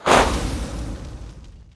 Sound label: Hit